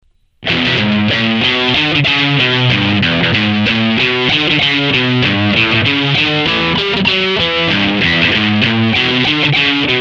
strat front, fuzz factory, フロントのシングルピックアップ+ギターのボリューム8; Fat drive (2)